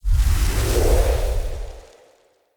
Invis Cast; Fey; Saboteur

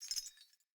Movement Chains; Characters; Enemy; Foley; Sinecure; Melee Grunt

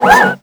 Jackal Leg Servo, Machines; Warframe

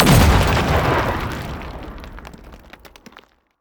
Ghoul Expired Grenade Explosion; Warframe; Grineer Ghouls

